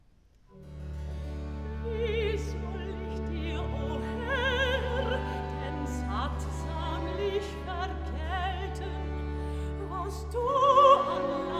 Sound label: (Alto), BACH for ALTO; BWV039; Wie soll ich dir o Herr